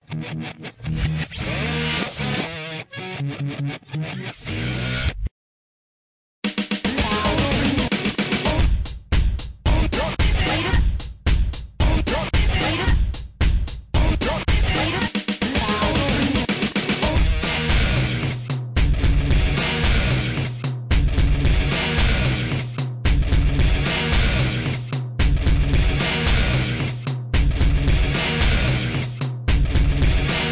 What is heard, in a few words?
Hip Hop Beat; REMIXABLE COLLECTIONS; alex merced shorts